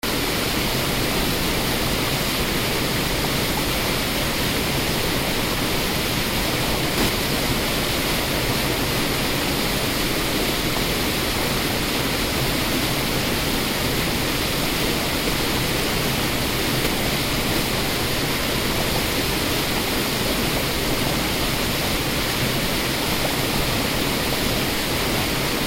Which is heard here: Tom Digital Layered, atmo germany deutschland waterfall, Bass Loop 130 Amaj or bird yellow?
atmo germany deutschland waterfall